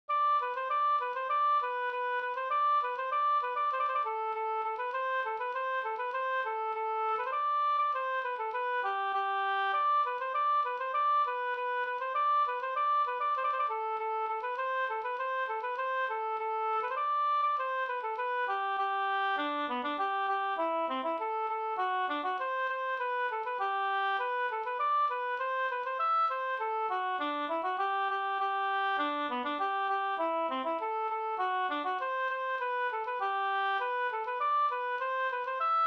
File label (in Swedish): snoa